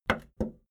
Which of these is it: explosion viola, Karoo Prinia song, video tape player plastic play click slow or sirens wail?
video tape player plastic play click slow